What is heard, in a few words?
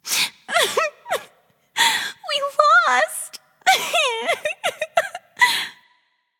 boohoo; Smite; athena